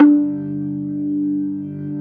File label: B3 HAM G1, organ; AKAI S-Series CD-ROM Sound Library VOL-2